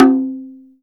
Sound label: GENDANG E4F, GENDANG DRUM; Spectrasonics; Heart of Asia